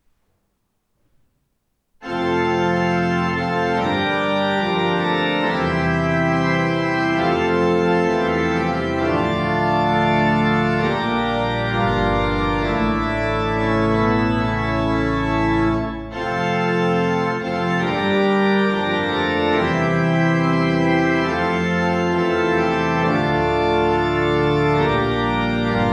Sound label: Fanfare; Langevaag